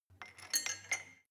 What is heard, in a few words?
weight bolt insert, fitnessstudio; sport freizeit